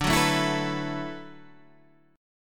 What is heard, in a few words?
D 6th Add 9th D, Guitar, Standard; D6add9 strummed; 10,9,7,9,10,7; JGuitar